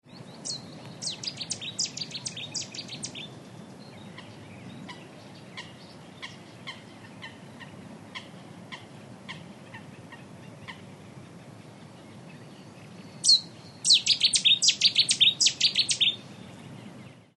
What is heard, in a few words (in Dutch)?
Cettis Zanger